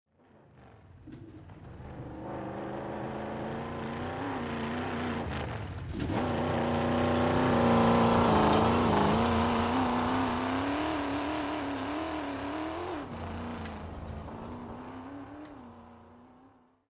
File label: Chevy V8 Hot Rod Drive By, Hot Rod approaching and drive by, medium speed; Car Sound Effects; Hot Rod sounds